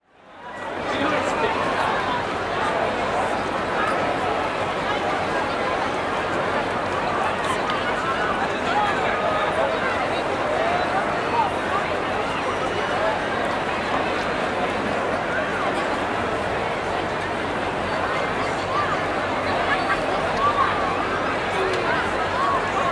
Crowd Outdoor Large Festival Audience; crowd walla; Sound Ideas; Walla Series

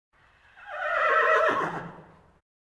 Animal effects snorts grunts farm ambience; Valentino Production Sound Effects Library CD50

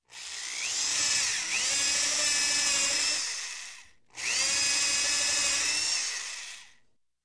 Construction, Drill One Hole, Hand; Sound Ideas 2000 Series

